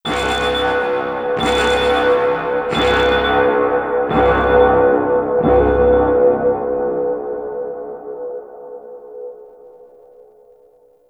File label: Alarm; Warframe; Tau; Cinematics